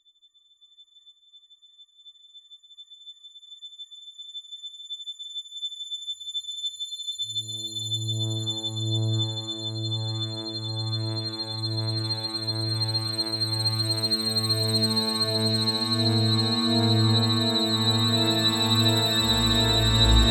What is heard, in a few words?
Intense Horror Build Up